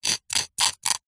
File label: foley stone rock carve engrave scratch with small sharp metal spike